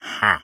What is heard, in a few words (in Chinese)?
待机声; 幻术师